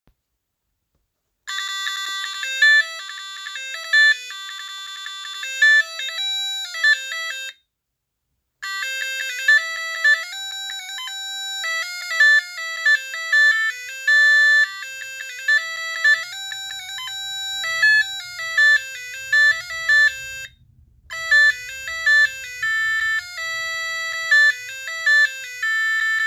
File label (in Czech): melodie, Digitální hodiny s budíkem; JVD DH9711 - Digitální hodiny s budíkem a velkým výběrem melodií